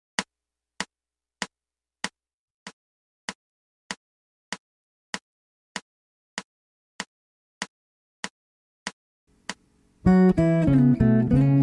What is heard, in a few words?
folk rock